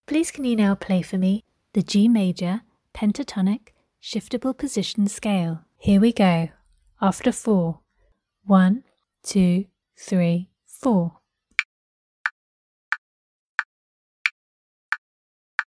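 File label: organ, G major pentatonic shift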